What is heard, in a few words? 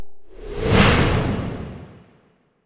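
Elementalist Skill swoosh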